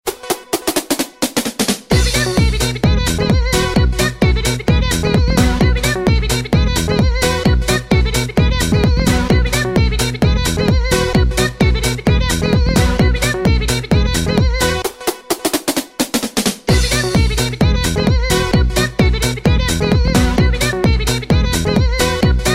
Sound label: Doop Doop